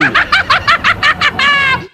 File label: laugh witch; ooh ahh